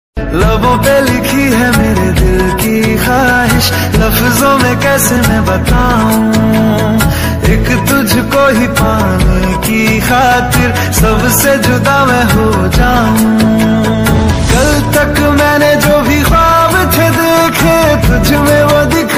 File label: new hindi ringtone; genius film